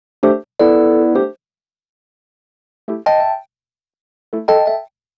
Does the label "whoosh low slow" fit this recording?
No